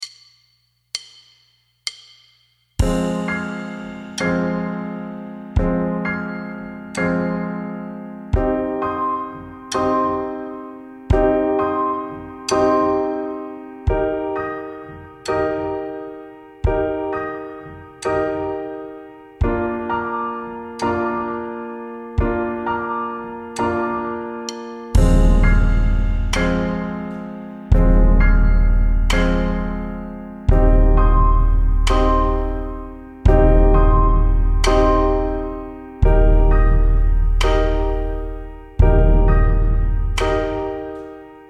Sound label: Swans Melody INSTRUMENTAL